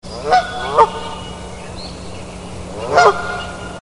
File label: Two Geese On A Pond